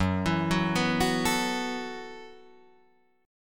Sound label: F sharp Minor 11th, Guitar, Drop D